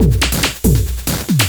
drum loop D+B 160; eJay

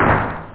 clap; da capo vol1; drums nature

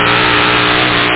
CAR

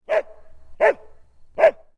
DOG, residential

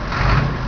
Truck; Kid Pix Demo

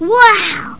win; ABALONE

